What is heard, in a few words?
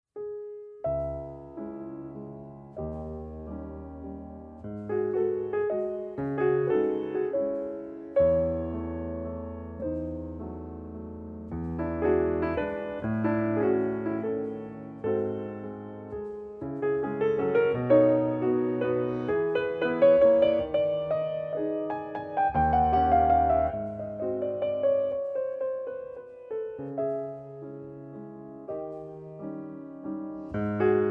Waltz No.7 in cis-moll; F Chopin